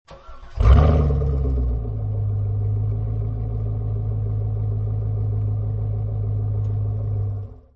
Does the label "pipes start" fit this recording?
Yes